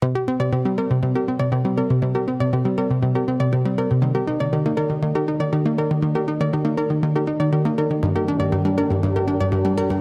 Electronic Impulse

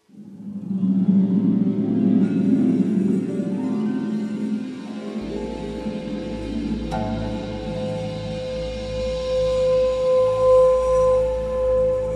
Chinese Meditation

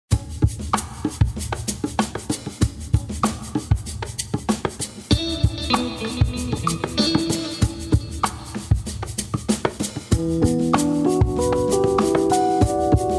Rhythmical Funk Vibes

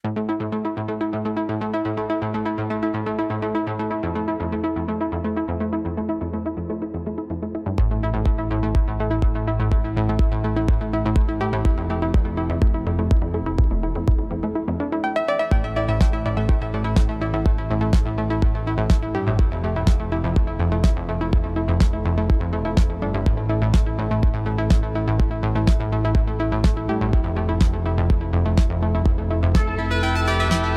Electronic Impulse

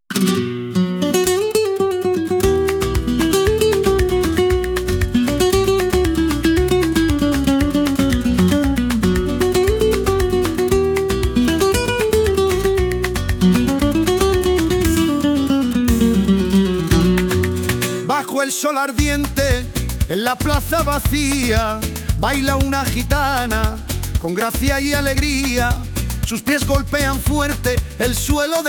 flaming strings

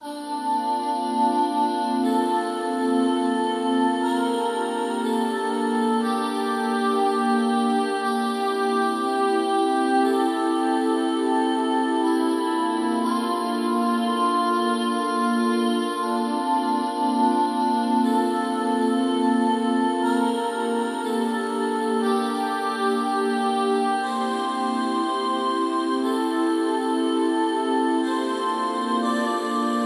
Moonlit Groove, Rytmiczny chillo

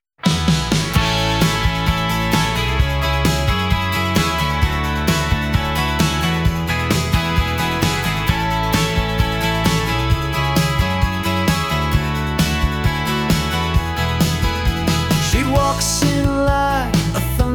jangle pop

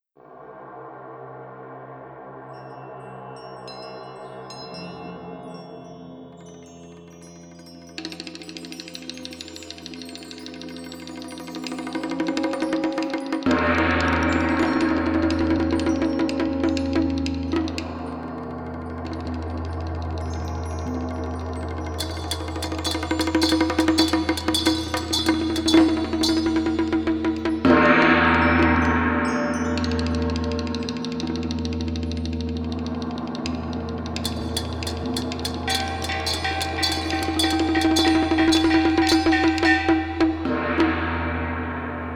om mani padme hum

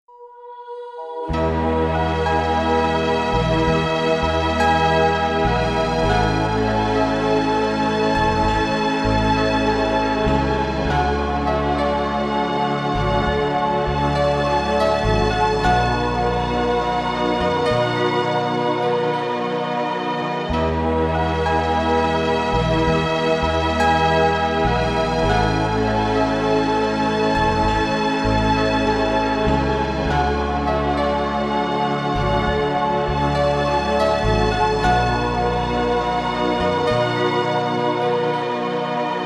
spa music 432 Hz; podziekowania

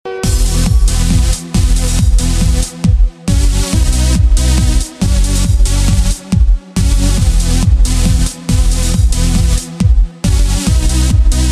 turbo beat 432 hz

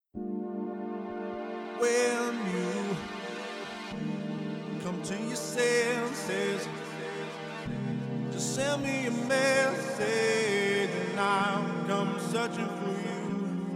vocal chill, 432 hz

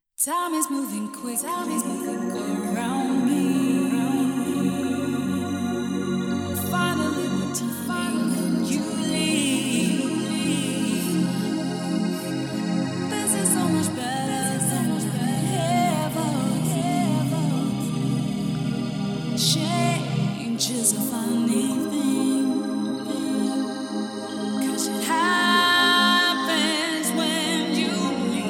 vocal chill 432 hz

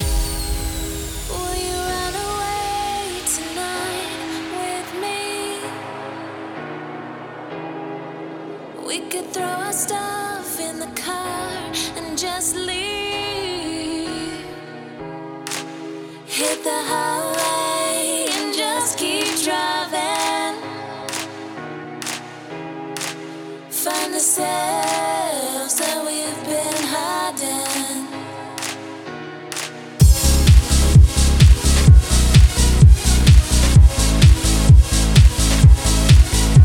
House Grooves; House Grooves Unleashed; Leap into Space

